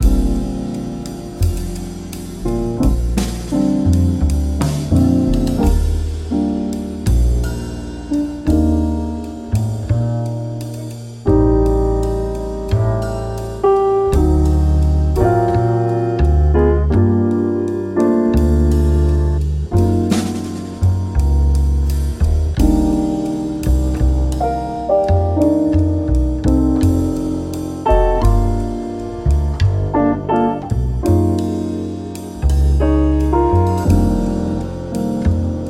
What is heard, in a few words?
Jazz Trio Heavy Rain Slow Bossa